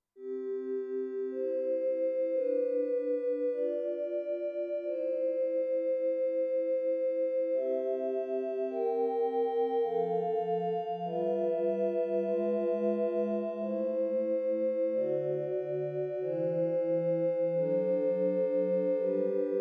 Glass Pad

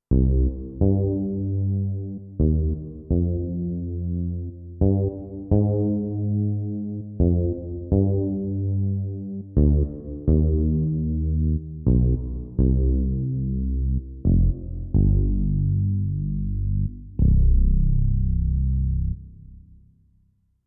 Mod Bass

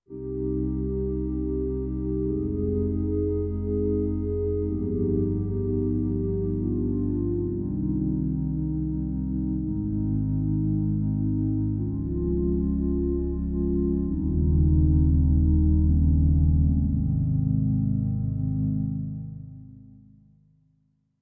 Sine Pad

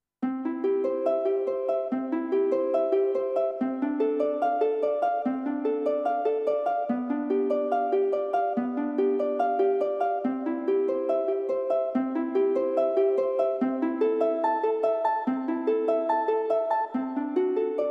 Ukulele

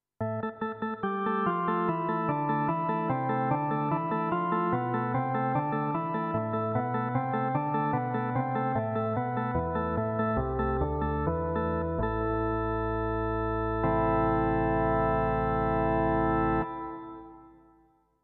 70s Draw Org 2

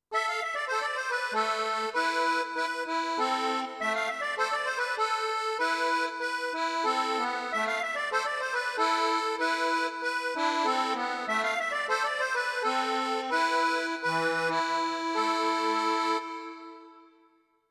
Accordion

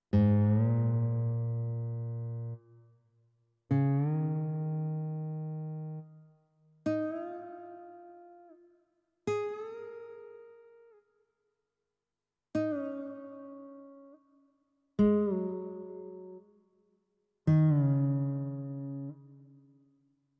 Pitchbend

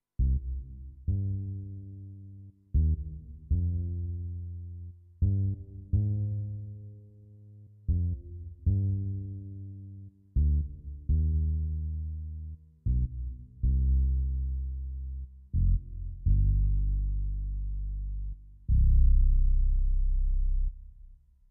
Finger Dark

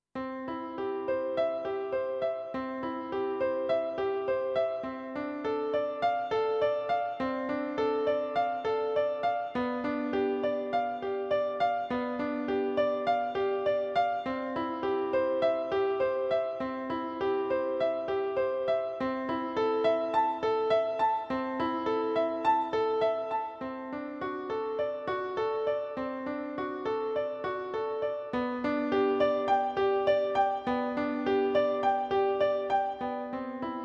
Grand Piano

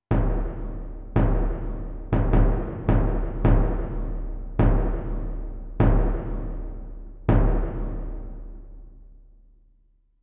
Gran Cassa

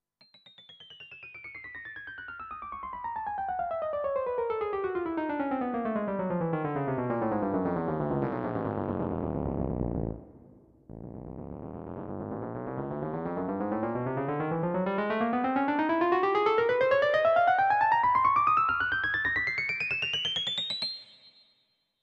Vintage e piano

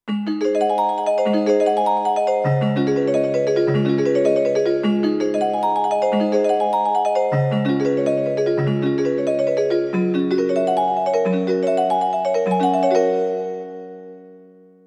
Music Box

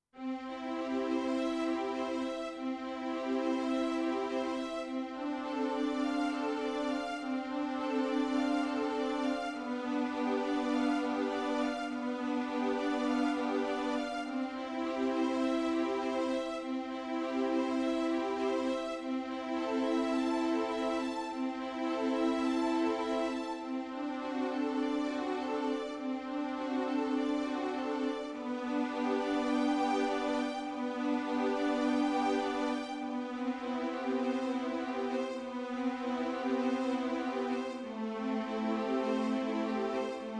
Strings

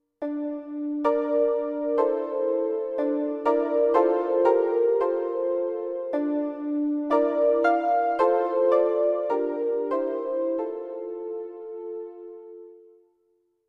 Warm Atmos

